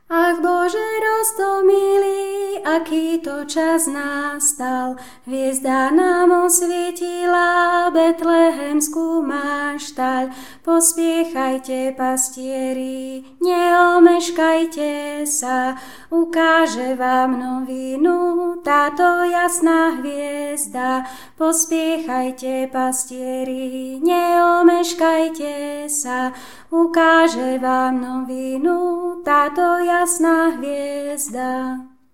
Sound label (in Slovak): alt, Ach Bože roztomilý; Vianoce 2016; spevokol